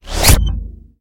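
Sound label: modern telephone; classical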